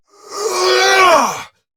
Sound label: Attack Effort; Battle Barks; en; DaxAshigaru; Warframe